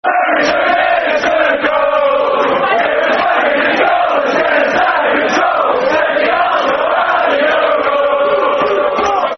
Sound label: Follow, Follow, Follow, Football Chants (Soccer Song); Chelsea Cfc